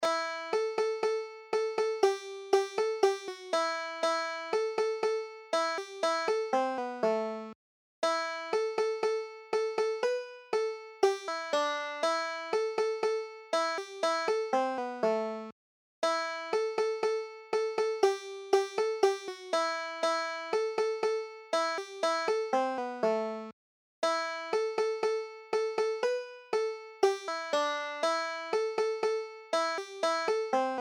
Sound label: banjo